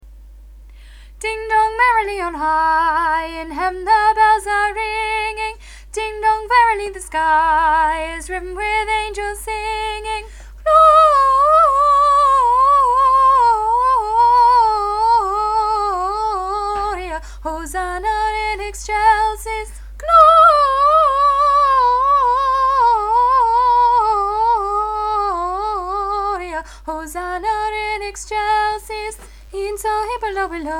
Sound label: tune